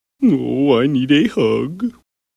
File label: grr arrgh; buffy; hug